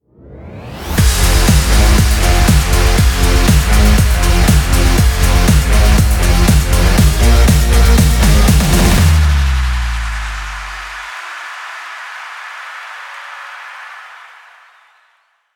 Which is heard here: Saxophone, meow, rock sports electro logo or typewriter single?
rock sports electro logo